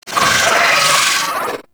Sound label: Vocal Death; Warframe; Moa Satyr